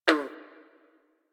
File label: Forest Frog Close; Warframe; Grineer Forest